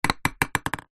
sport table tennis ball throw into small wooden container